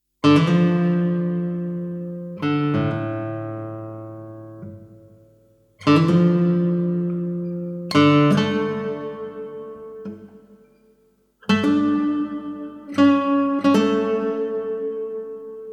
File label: fandango adagio; aguado